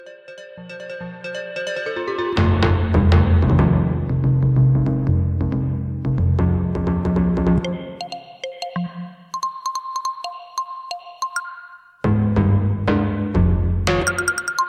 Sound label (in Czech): Elektronické tympány na jazzové procházce